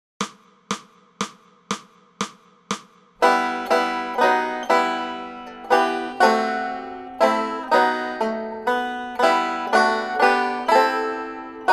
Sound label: Banjo; Coventry Carol; Banjo Tablature with Play-along Audio Tracks